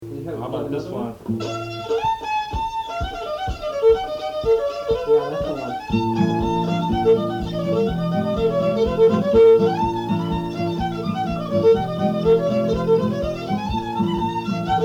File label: Breakdown in A; greatlakes